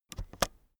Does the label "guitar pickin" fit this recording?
No